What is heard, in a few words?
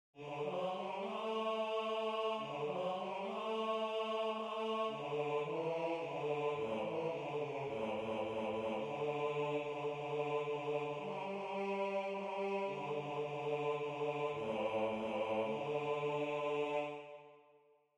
Bass